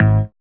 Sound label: Synth Bass, Roland